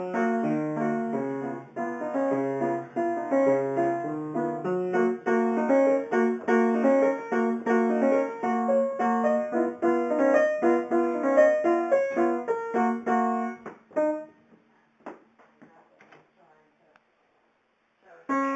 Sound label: playing chop sticks; age 7